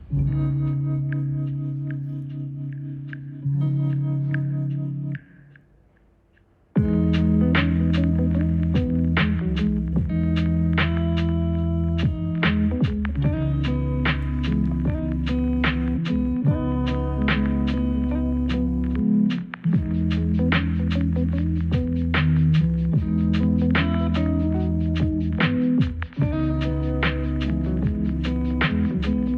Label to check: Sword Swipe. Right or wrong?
Wrong